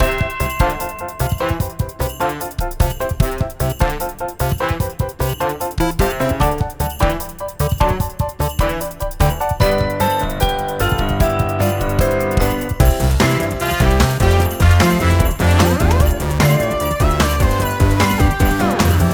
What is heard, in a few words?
Music Winter; lego brawls gamerip 2022